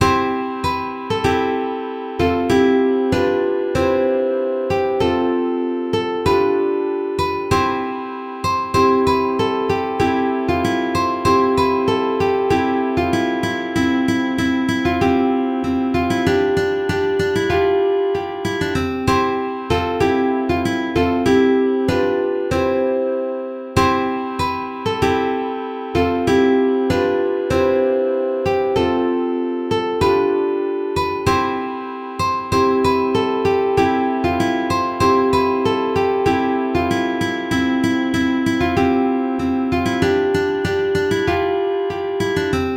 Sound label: ukulele